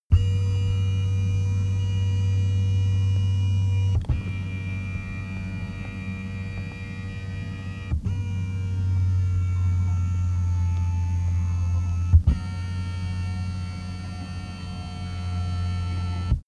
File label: car seat massage sequence